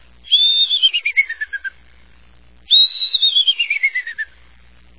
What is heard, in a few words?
killdeer